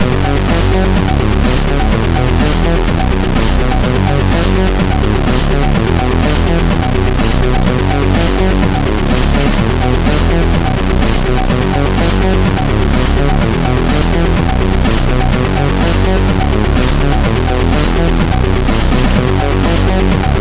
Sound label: MOS6581R4